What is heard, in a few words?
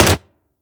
Syringe Gun